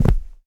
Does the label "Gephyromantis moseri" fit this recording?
No